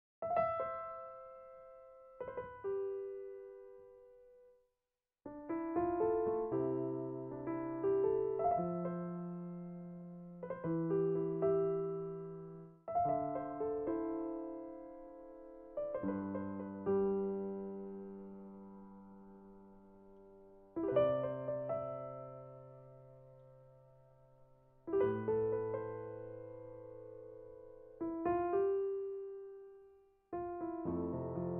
店铺叫卖背景音乐

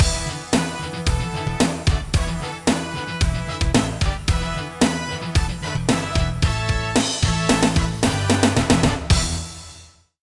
片头音乐

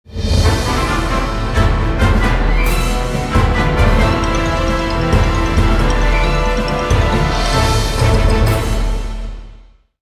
大气的背景音乐

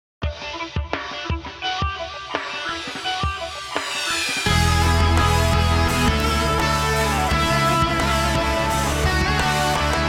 片头音乐

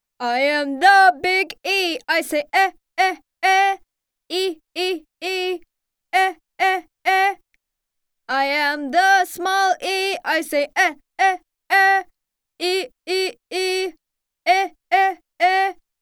外籍女英 外语 美式英语 boy儿歌

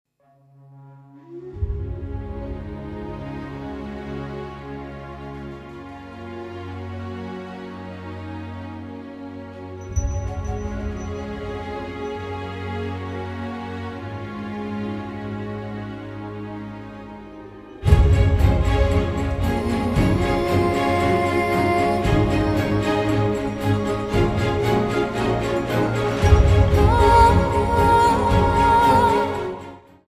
专题片背景音乐; 30秒以内

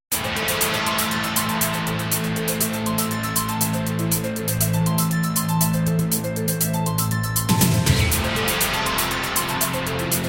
宣传片背景音乐

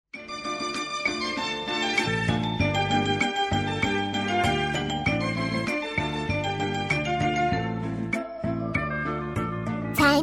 儿歌

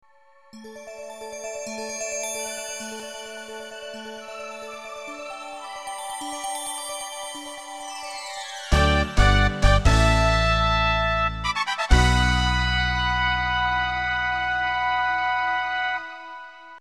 电视包装音乐

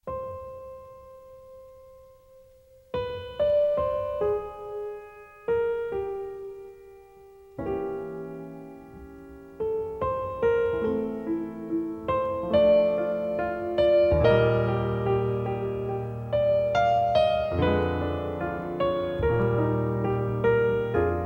电影音乐; 奥斯卡电影音乐

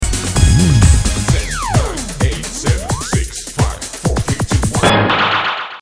电视包装音乐

